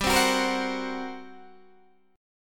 G Minor Major 7th Double Flat 5th, G, Guitar, DADGAD